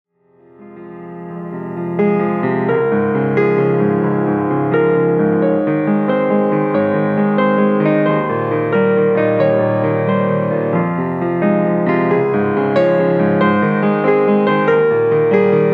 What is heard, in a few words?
Piano